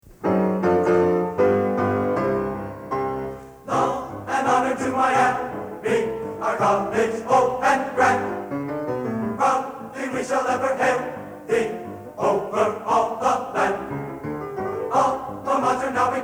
Fight Song; Miami

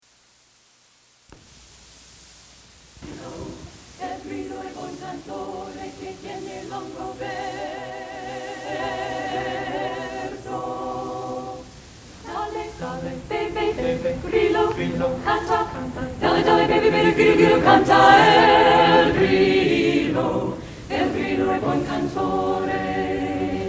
Renaissance Singers; El Grillo

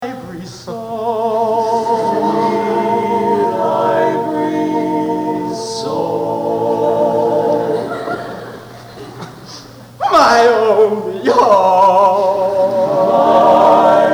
Mens Quartet

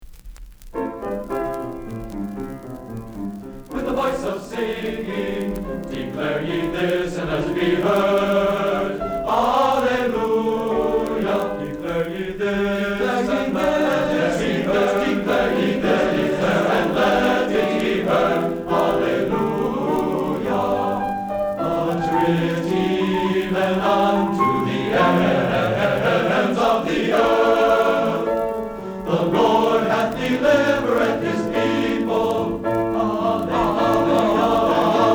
With A Voice Of Singing

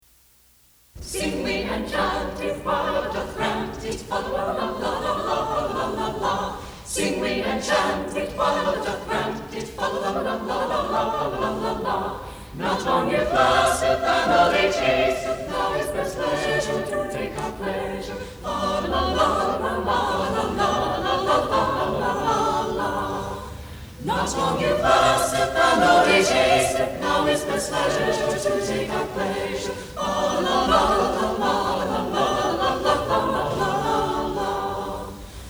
Renaissance Singers; Sing We and Chant It

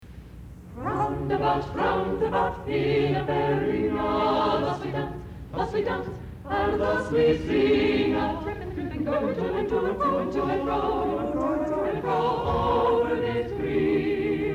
Singers Roundabout; Renaissance

